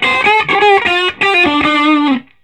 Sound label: SOLO, Vintage Blues Guitar; USB Soundscan vol.22